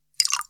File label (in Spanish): Agua